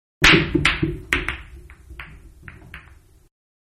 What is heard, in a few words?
Billiards-Balls Clicking Isolated; Pool; Sports; Manhattan Production Music